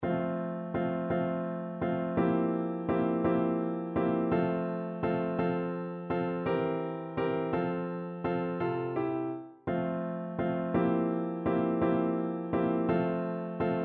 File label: Thirds Accompaniment Medium voice; Grade 2